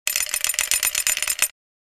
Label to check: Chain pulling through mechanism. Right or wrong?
Right